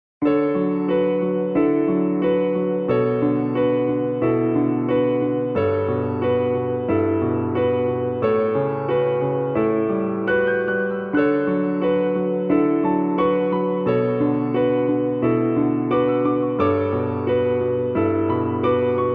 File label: piano; maoudamashii